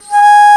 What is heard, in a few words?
Alto Flute p G#3; Digital Sound Factory Virtuoso